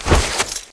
dart swing, weapons

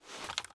lean out; m16a2

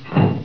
foot left; Half-Life; Alien Grunt

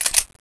tau back

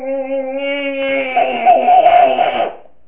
myehhhhhhhh

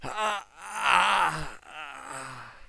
player die

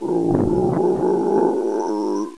zombie idle

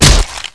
body medium impact hard, physics

